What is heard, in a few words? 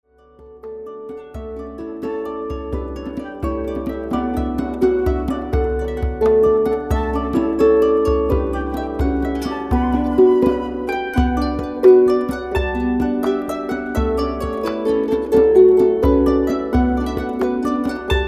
Sweeper s tune, harp